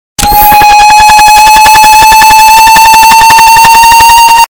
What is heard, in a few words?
cloaker sound but loud